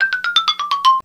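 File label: xylophone down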